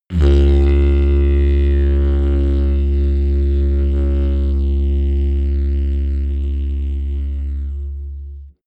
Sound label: didgeridoo straight tone